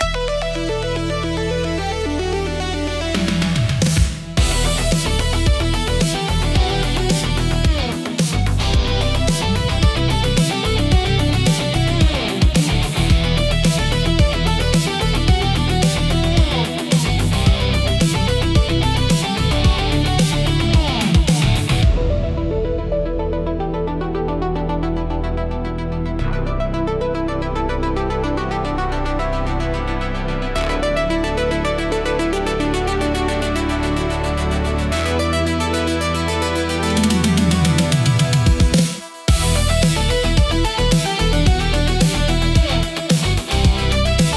energetic synthwave for podcast funky guitar high energy